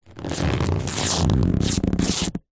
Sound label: cartoon belly expand clothes rip